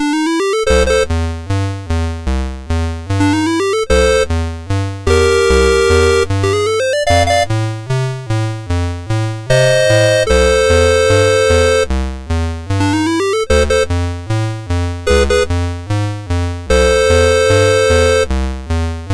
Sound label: Constant Bass, MOS6581R4